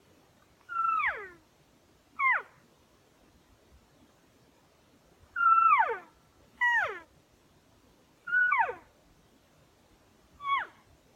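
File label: Calls Mews Chirps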